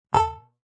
Pong; Pings; multimedia

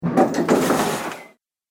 Hotel Elevator Lift Door Opens

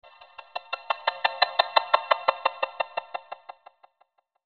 SHIMMER, Shimmer Chopper Delay; Blastwave

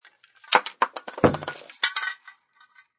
Spry Pnt Cn Drp, Dropping aerosol can.; Spray Paint Can Drop; Spraying; Objects

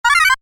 Computer Beep, Short; Multimedia; Stuart Duffield

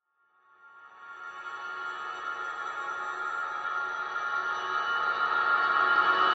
Horror Crescendo Vox Reverse; Blastwave

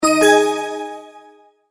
multimedia tone verified; Tones; Sound Design; McKinney Sound

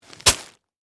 Bag Rocks Down, Foley, Plastic, Set; Sound Effects:Materials

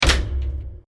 Deep Bursting Wood Impact; Bursts; Impacts; Crafted Signals

